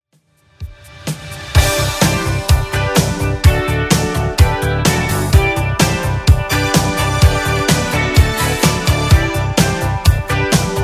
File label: 80s 127bpm; Redrum